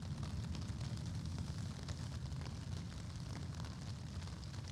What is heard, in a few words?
Ambi Loop, outdoor samples Ambience Loops